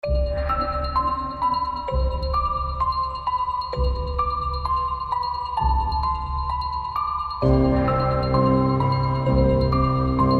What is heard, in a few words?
Creepy Campus; Xenoverse Per Aspera Ad Astra; Newtron City, Xenoverse